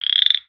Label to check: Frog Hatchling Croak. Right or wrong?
Right